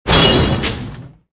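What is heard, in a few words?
footstep, atst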